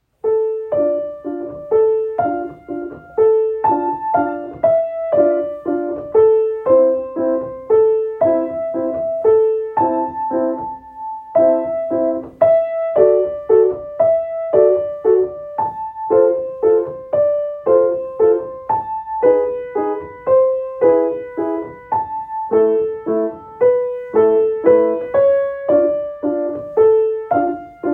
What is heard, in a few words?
waltz; Kabalevsky waltz